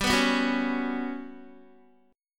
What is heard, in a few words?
G Major 7th Flat 5th, Guitar; strummed; JGuitar